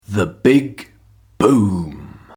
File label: Big boom